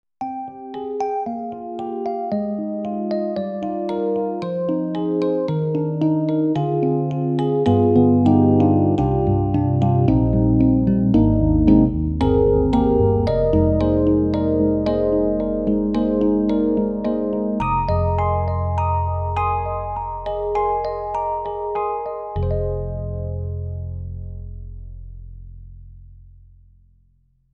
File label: Contemporary Soft Rhodes Fat Rhodes; SD-90; EDIROL